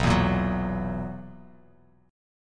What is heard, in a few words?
B Major 7th Suspended 4th B down Bass Standard; BM7sus4; strummed